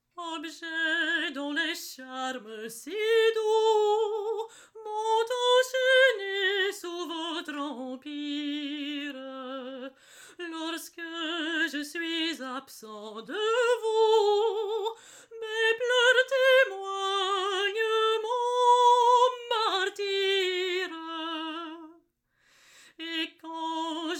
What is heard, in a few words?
Solo Women; assassin s creed unity gamerip 2014